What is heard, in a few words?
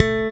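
Bass, short thumb A3; Sampleheads; Will Lee Bass Library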